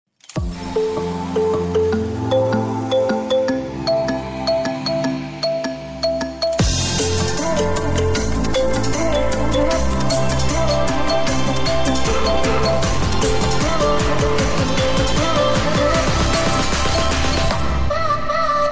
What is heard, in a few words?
Honor 9N Ringtone